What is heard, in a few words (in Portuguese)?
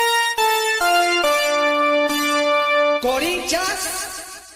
vinheta da radio; corinthians